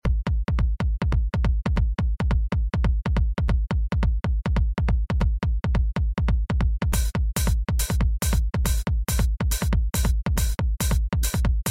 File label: four loop